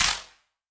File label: Wider Clap